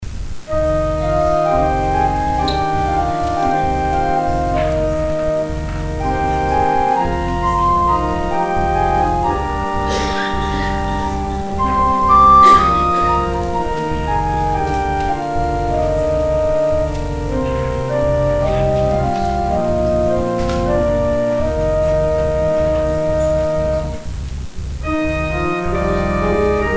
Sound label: organ interlude; Interlude during the collection; St. Mungo's